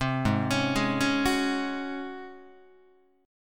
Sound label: G sharp 11th G sharp, down, Guitar; arpeggiated; JGuitar